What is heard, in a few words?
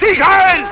sigh